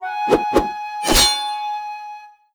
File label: Win; Sushi